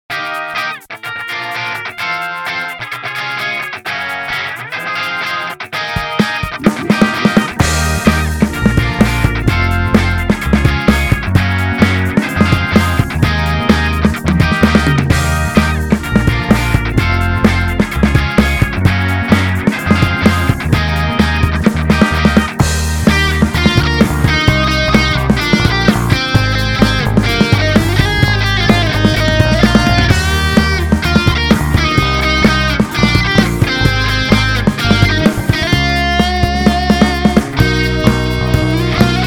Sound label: 8-bit; Sonic the Hedgehog